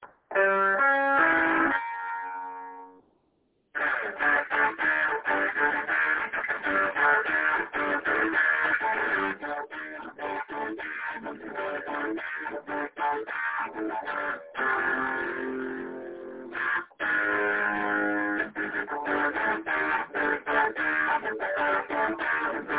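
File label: Tele with bridge pickup sound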